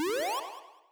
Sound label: powerup